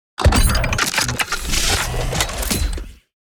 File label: Cybernetic transformers robot transformation